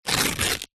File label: foley paper glossy rip